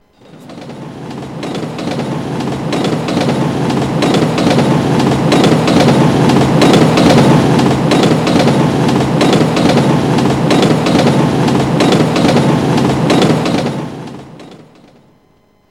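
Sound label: passing train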